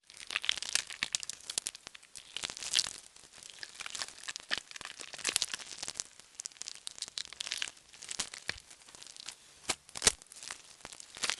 gore wriggle tortured squishy slime; sonniss gdc

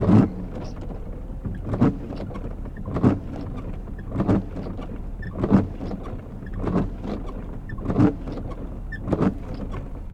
cadillac 1960 wipers; sonniss gdc

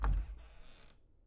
door creak with thump; baileywiki maps premium towns

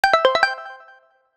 notification digital call arcade; sonniss gdc

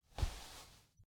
footsteps sand desert; weather and nature

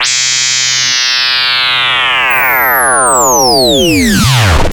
slow fall fade space warp alien modular synth; sonniss gdc

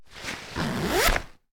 open bag zip, food and drink; consumables

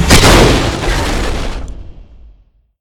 Heavy Impact

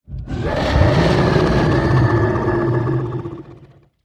dinosaur growl mono; fvtt sonniss gdc audio

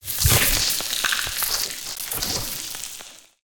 rips and tears designed humanoid guts; sonniss gdc

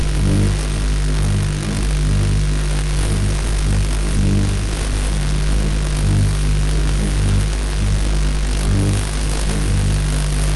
energy force field loop; sonniss gdc

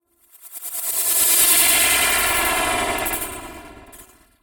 transition; sonniss gdc

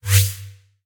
menu confirm; sonniss gdc

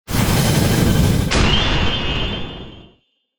ranged magic projectile 90ft; ranged magic generic

